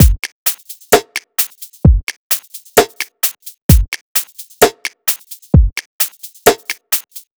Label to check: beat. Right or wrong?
Right